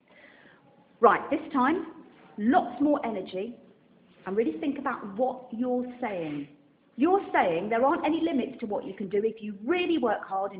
rap rehearsal

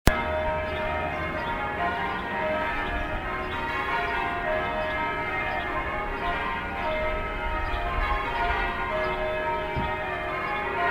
Sunday afternoon bells by river

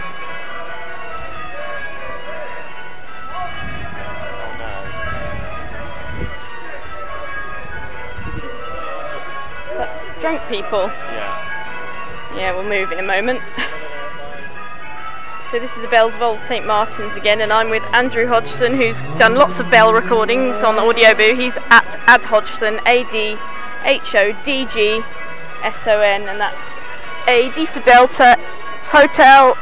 bells and child on glockenspiel; old st martin s

